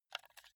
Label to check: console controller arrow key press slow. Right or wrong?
Right